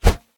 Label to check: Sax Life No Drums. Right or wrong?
Wrong